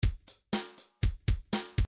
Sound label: country 1bar